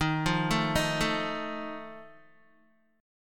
D sharp Suspended 2nd Flat 5th, Guitar; arpeggiated; JGuitar

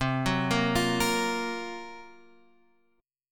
F sharp Major 7th Flat 5th C

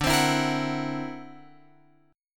D Augmented 9th down Guitar Open F; D+9 strummed; JGuitar

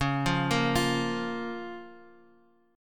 C sharp 6th, C sharp, Guitar, Open F; arpeggiated; 13,12,10,12,x,x

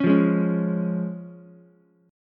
Db Major, Ukulele; strummed; JGuitar

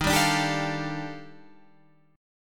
Eb Major Sharp 11th Eb Guitar Standard; EbM#11; strummed; 11,10,12,12,10,13; JGuitar

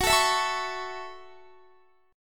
Gb Minor 7th, Guitar; Gbm7; strummed

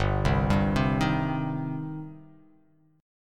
G Suspended 4th Sharp 5th, Gsus4#5 chord; arpeggiated; JGuitar

